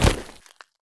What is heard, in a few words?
body drop asphalt; Escape from Tarkov